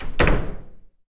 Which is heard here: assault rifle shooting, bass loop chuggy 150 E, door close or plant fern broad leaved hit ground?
door close